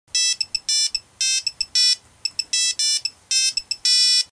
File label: Kick